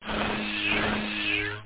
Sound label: BUZZ